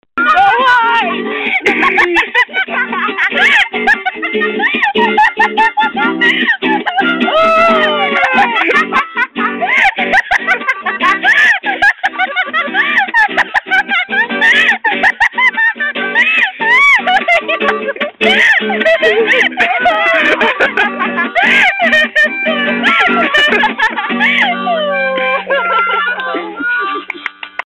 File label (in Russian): Гитара, Губная гармошка, Смех